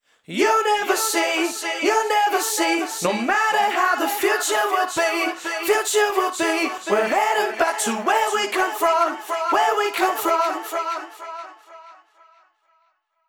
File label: Vocal Chorus